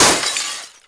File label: glass shatter; WAR